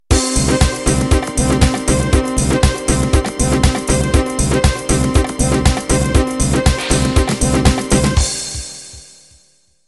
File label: House End